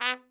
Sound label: TRUMP SRT, TRUMPET; Best Service; Killer Horns